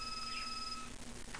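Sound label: telephone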